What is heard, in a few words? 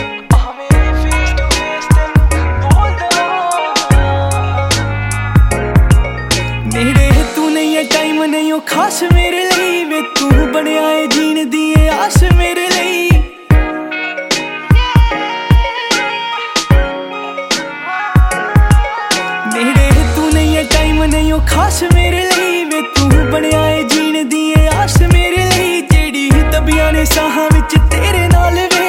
ringtone, Punjabi